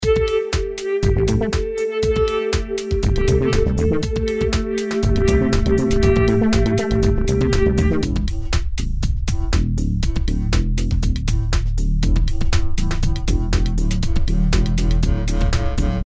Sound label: ebow